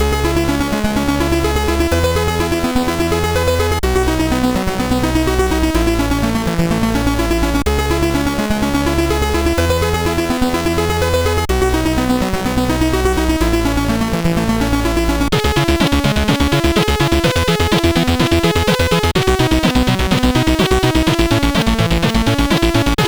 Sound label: Remix, sid CSG8580R5; hvsc